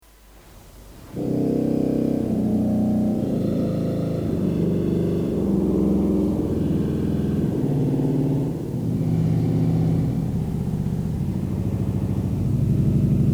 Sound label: Contra Trombone 64, organ stops